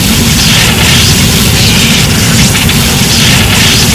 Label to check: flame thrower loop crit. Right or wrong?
Right